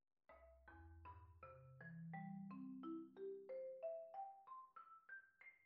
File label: marimba